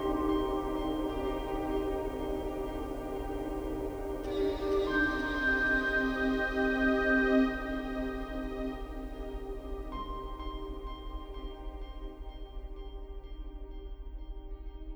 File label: I Heard This Song in a Dream - Fadeout Pad; BB 2HTC Samples Vol 4; Pads